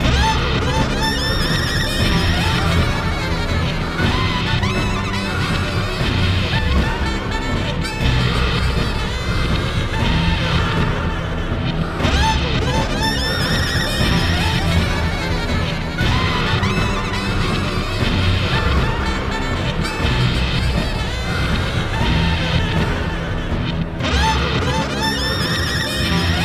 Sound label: Red Tree (Ambient Version)